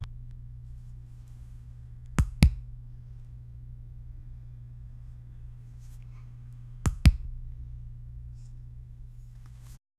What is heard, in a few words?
hover over a card in, game